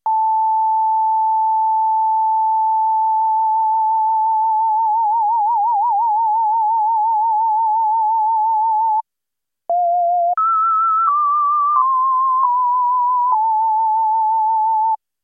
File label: martenot